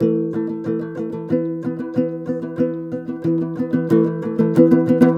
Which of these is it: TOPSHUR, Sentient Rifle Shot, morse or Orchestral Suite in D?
TOPSHUR